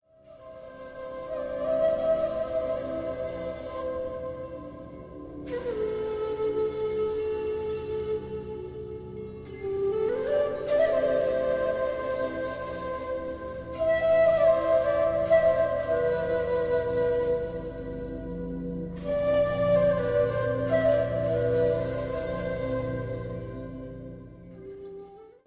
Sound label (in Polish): muzyka bez oplat